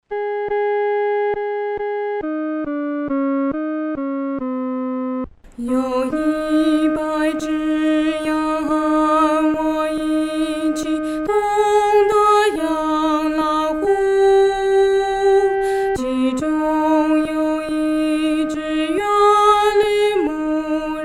独唱 第二声

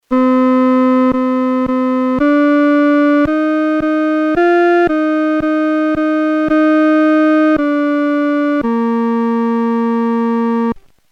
独奏（第二声）

